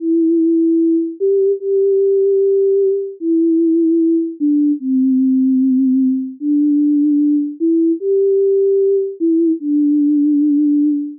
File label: vibrato-2(抖音2), Cmusic; Cmusic scr檔案: 古鴻炎 製作